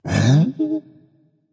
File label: seriously berserker; cuchulainn